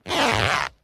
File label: fart squeaky short